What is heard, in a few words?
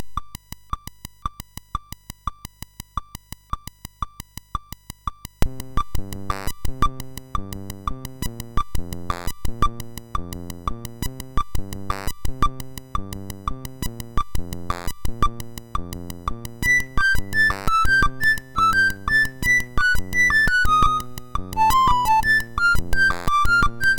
Bossa Nova, MOS6581R2